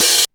Openhat, Sequential Circuits Drumtraks, drum machines